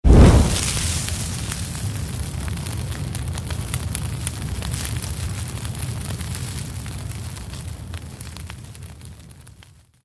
fire ignite

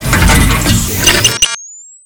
laser sentry place

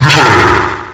jump; freak fortress 2; dry bowser

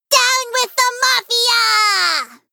battlecry; hat kid; a hat in time

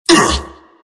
pain; tf; skeleton king

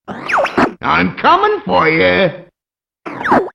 taunt; zerstorer

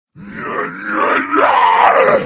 moarg alert

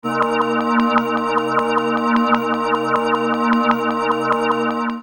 magnetism use

